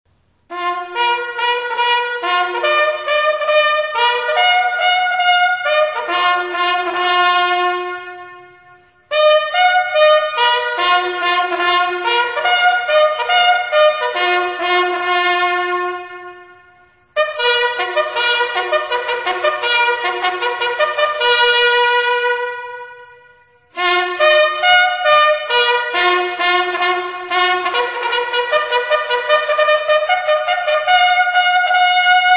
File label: tattoo, bugle